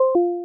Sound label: reconnect-failed